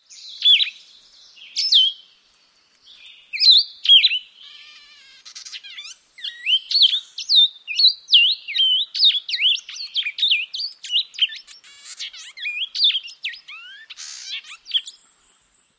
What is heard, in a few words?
run-on song interaction